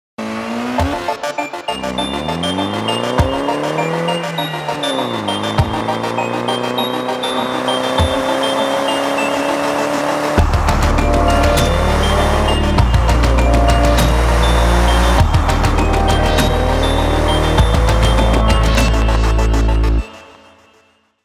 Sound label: Engine Door Seat Beeps; MINI FS